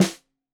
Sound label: Snare 65 Reg Stereo, Deeply Sampled Drums